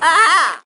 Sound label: "ah ah ah" female; Voice; ooh ahh